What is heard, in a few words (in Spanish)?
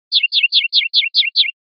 rep chachases, pardillo